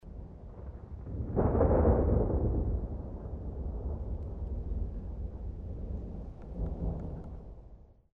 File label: lightning Rumble; Champions of Valhalla